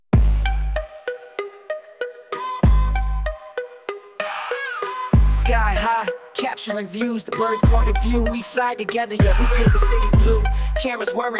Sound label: Jingle; We Fly Together Productions